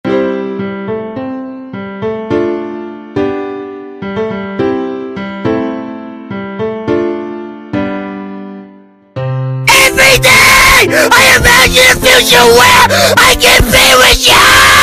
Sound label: Screaming; DDLC